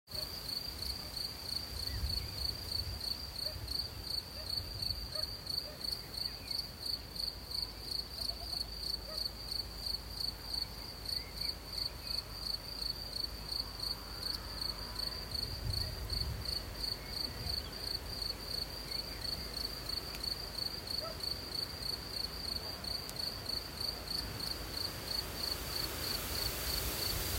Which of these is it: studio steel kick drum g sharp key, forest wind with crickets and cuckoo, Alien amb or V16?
forest wind with crickets and cuckoo